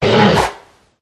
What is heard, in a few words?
werewolf boss attack voice up